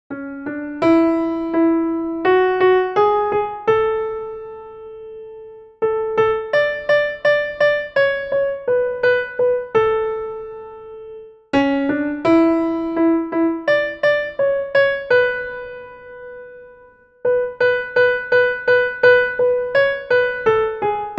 sopran

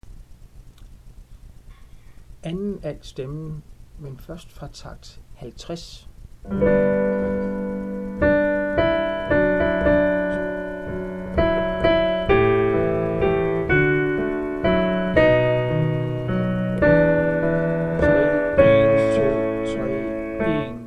2. alt stem

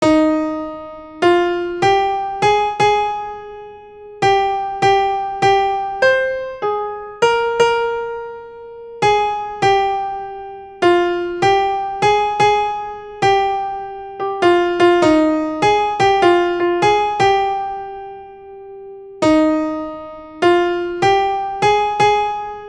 TENOR; Deep river

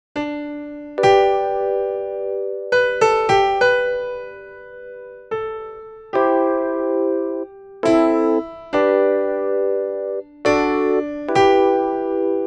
Amazing grace melodi

